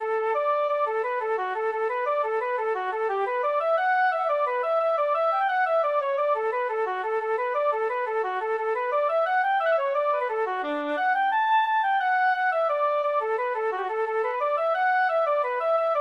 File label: for flute oboe; anonymous the merry blacksmith